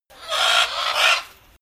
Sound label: bird screecher; natur-tiere; voegel